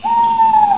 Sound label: owls, animals, birds